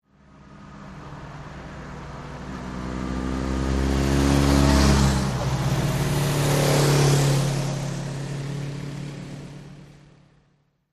Car And Motorcycles By Medium Speed, Cu. In Succession.